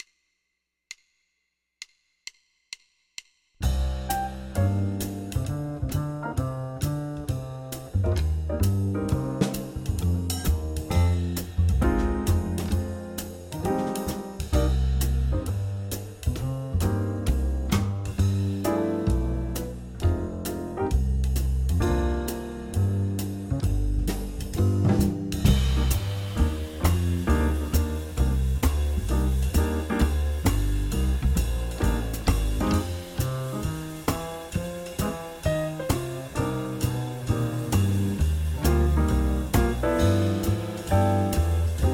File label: blues winds